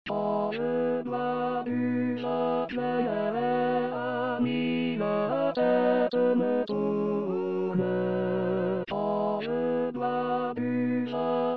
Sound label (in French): l harmonieux vigneron tenor voix